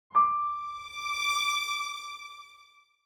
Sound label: Mobile game piano strings level up